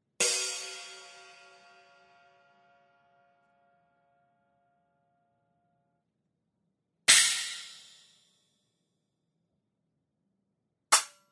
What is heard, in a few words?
Pre Configured Stack; cymbal